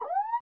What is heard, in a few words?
WARNING; PC Gamer IT CD 30; incoming